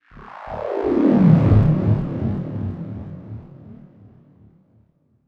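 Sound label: deep house FX, 125 bpm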